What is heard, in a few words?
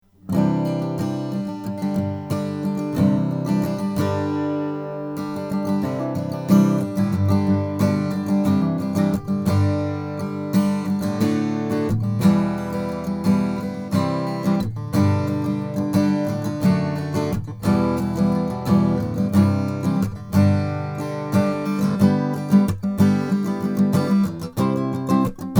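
om grand indian rosewood, guitar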